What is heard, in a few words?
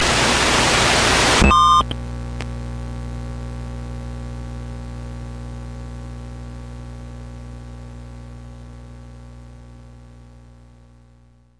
Static End